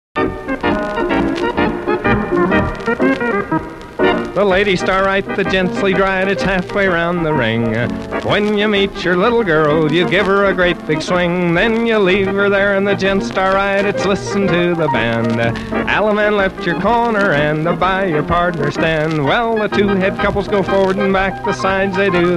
broadmoor promenade [5 part singing quadrille] (prompted)